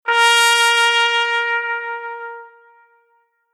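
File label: Horn Decrescendo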